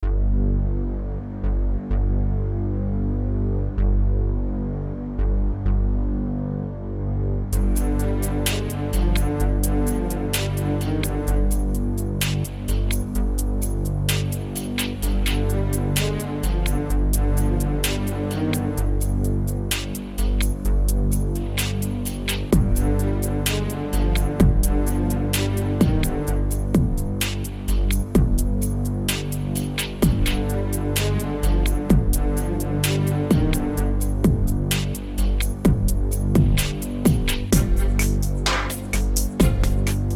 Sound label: Field Music; A Bloody Night